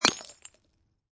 Glass Bottle With Liquid Break, Impact, Glass Beer Bottle, Liquid Inside Bottle, Break On Cement